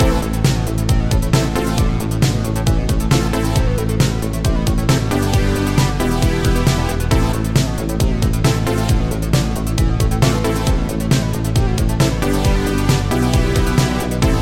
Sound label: Synthwave Character Select